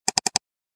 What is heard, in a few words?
Old mouse double click